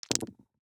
DICE on Felt, Throw and Roll, Standard, 6 Six Dice; Samples; ArticulatedSounds; DICE Sfx Party (Extended)